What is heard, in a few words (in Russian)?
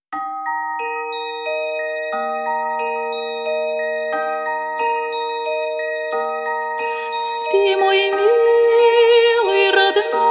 Колыбельная; Брамс